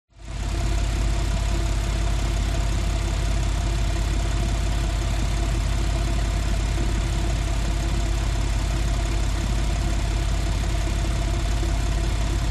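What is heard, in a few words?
SPORTS CAR Exterior Engine Idle; TRANSPORTATION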